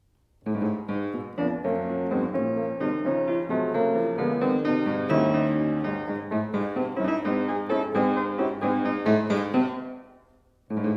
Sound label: Sonata in C sharp minor Scherzo